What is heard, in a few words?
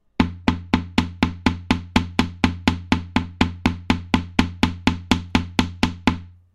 guitar eq example